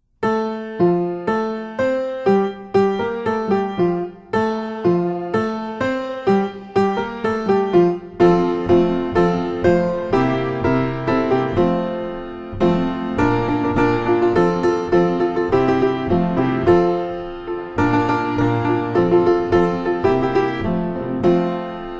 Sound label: Piano